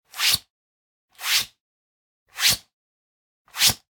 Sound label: Generic Slide; boom library; The Interface